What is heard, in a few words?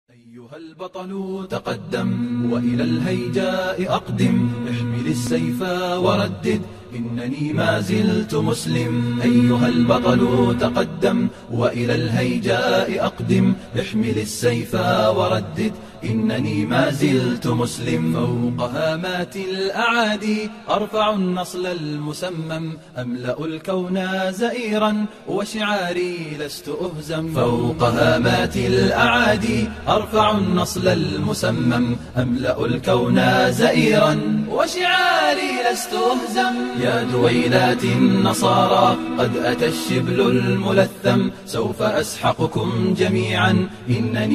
Vocals Only, nasheed